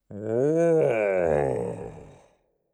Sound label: getting dizzy; bumbino